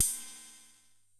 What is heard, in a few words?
KR55 CYMBAL; Crypton - DrumBase5000; KR55B